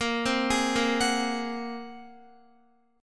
Bb 7th Suspended 2nd Sharp 5th Bb, Guitar 4E 4A 5D 5G 5C 6F; Bb7sus2#5; arpeggiated; x,13,10,13,10,13; JGuitar